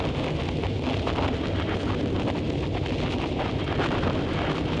Cracklature, sparse soundscape

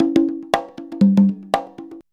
CONGA 119; USB Soundscan vol.56 - Modern Percussion Loops; AKAI

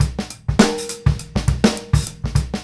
groove, Grooves 99-132bpm; E-MU Producer Series Vol. 8 – Platinum Phatt

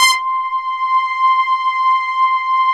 SYN, synthesizer bass; VFX-SD

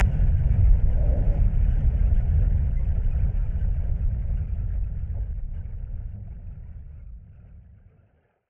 Noise Bomb Boom Filter; musicradar; bomb factory

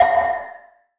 Perc, Gated Reverb; musicradar

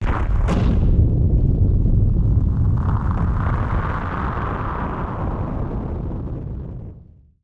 Firework FX; musicradar; bomb factory

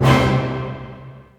Orch Hit Maj; Roland M-OC1

